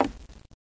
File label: wood; player